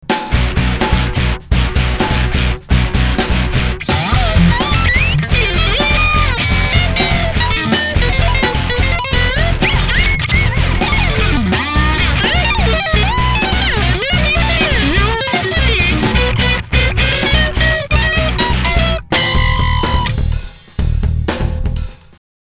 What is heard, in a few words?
guitar solo